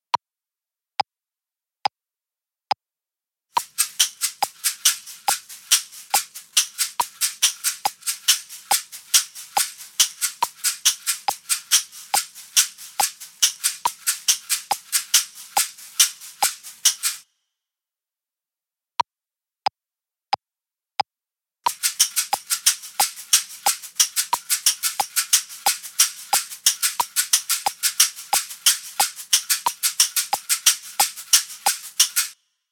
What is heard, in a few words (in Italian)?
Con due Caxixi Differenti; shakers